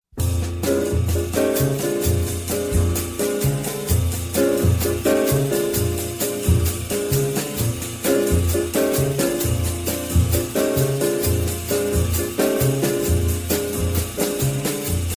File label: piano drum ringtone